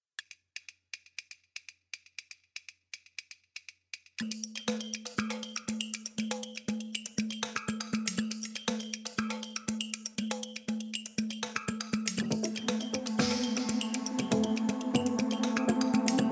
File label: Tension Light